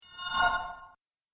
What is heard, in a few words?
Santa Ice Spell, magic